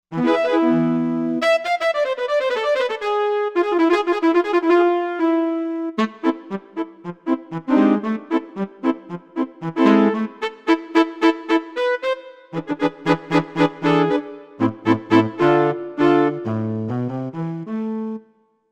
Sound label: Soft Reeds